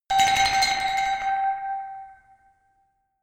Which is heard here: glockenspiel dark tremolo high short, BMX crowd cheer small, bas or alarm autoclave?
glockenspiel dark tremolo high short